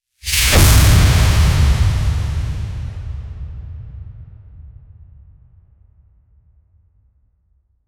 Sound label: cinematic spark, metallic